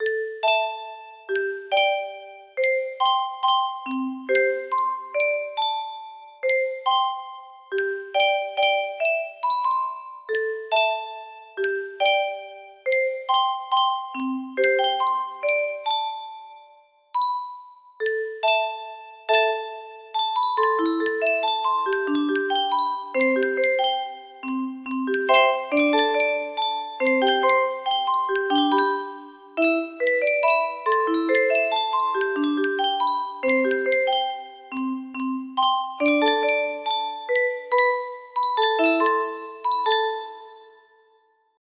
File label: haunting piano refrain